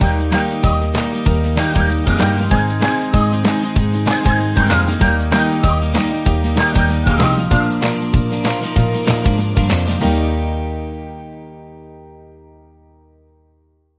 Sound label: Bumper